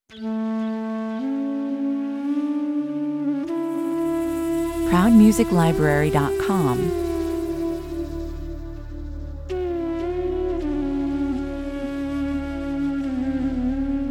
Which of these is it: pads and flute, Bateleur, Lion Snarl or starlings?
pads and flute